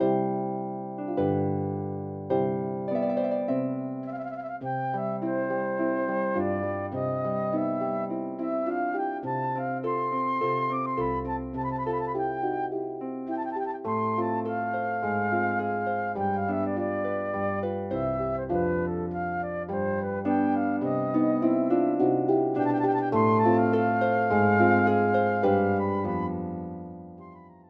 Flute and Harp, andante in c

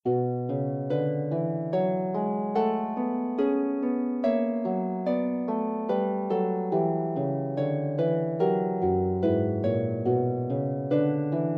prelude, Harp

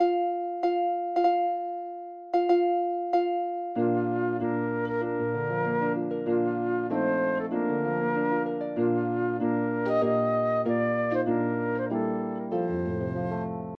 bridal march, flute and harp; Wedding Music for Flute and Harp Vol 2

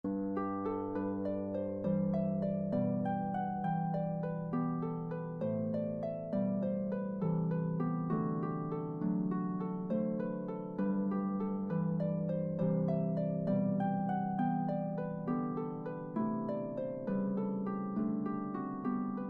Harp

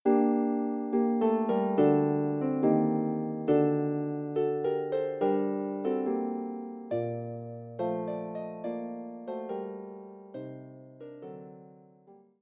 Harp

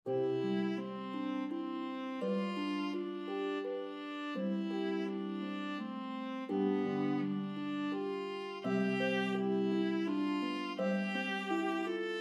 Harp and Melody Instrument Viola

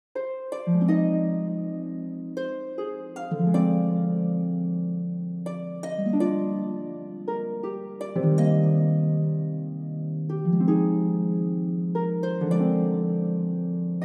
Winter Waltz, harp

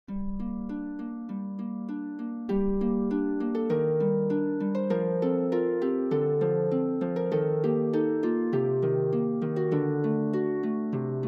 Famous Music for the Harp

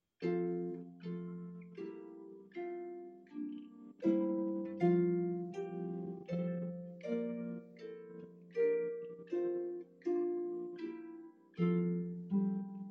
Ancient Yuletide Carols for Lever Harp; A Treasury of Ancient Yuletide Carols for Lever Harp Vol. 3; What is this Fragrance

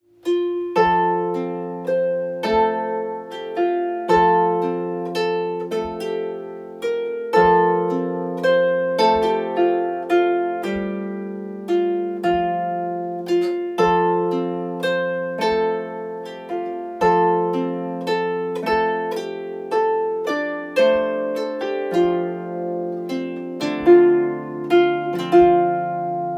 Gaelic Airs, harp; 4 Gaelic Airs

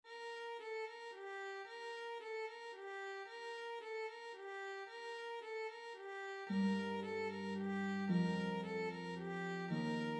Pedal Harp and Violin

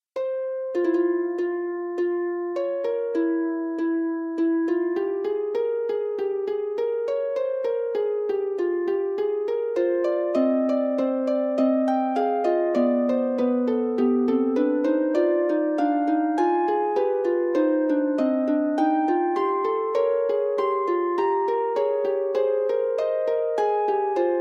Fugue, harp

